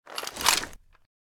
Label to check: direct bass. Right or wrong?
Wrong